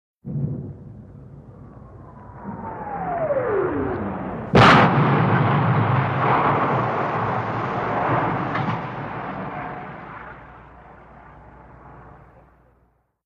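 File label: Incoming Shell Whir Impact, modern artillery exterior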